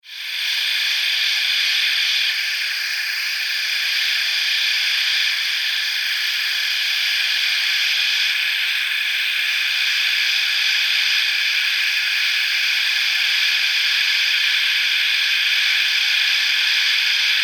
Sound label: Alien, Jungle, Insects, Creatures, Scifi; 5.1